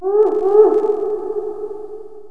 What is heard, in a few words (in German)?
uhu